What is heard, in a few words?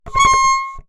Ornament, Melodica